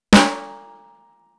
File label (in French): Caisse claire rim shot